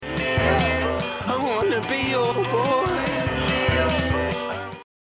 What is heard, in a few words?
Rolling; Nokia; 5500 Sport